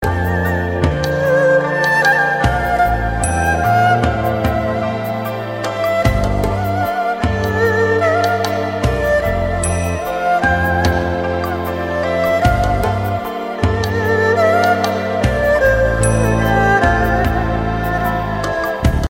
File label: Chinese Music